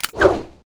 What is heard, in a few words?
grenade pin; ufoai; weapons